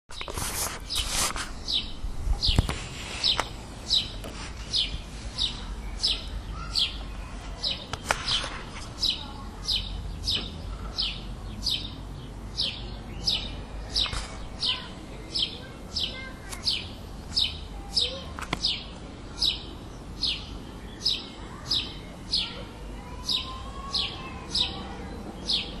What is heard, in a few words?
annoying sparrow; Swiss